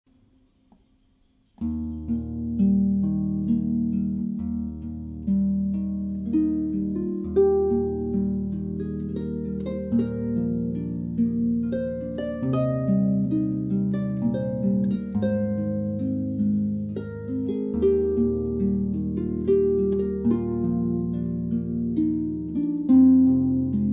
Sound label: hymne duet; wedding music for harp